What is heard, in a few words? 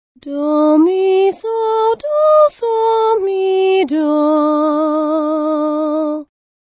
blending your two voices